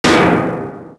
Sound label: metal hollow hit; projectiles; bullethit; Max Payne